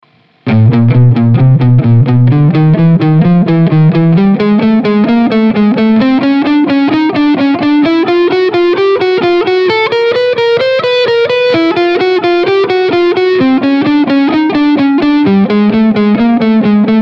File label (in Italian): Esercizio 5 Corde adiacenti